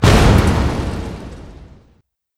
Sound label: Gasoline Can Explodes; angry birds rio